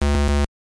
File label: Wrong; super mario bros. 3 1988 nes